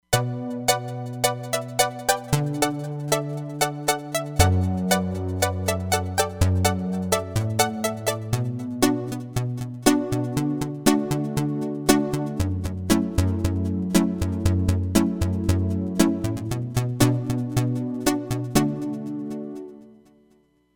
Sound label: Flip Pad, Roland Fantom XR